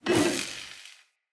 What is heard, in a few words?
Lightning Grenade Projectile By; Weapons; Enemy